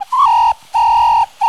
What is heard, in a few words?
BIRD, bird call; Greytsounds VOL-1; BIRD CALL FX